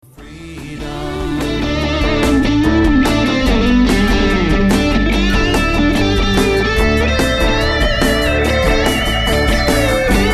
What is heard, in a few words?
ring tone